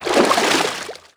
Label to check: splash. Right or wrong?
Right